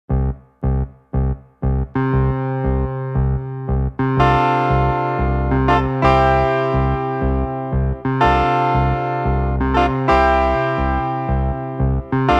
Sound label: Piano